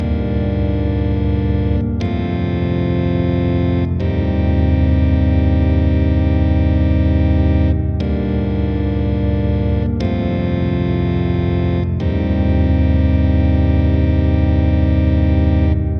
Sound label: Gothic Music Organ